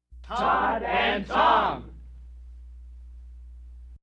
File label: shout